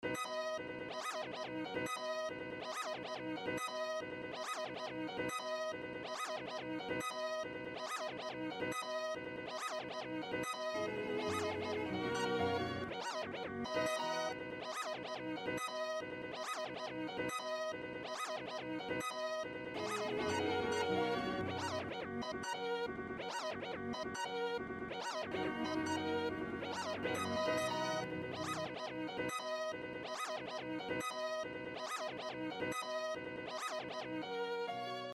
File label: instrumental; 2006 glitch instrumentals